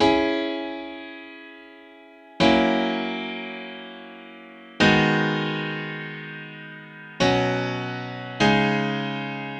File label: Grand Piano - 100 BPM (C# Minor); Seasons Change; Ghosthack; Free Guitar And Piano Samples